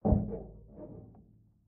thump squeak distant; Project Zomboid

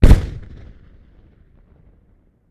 explode; Project Zomboid

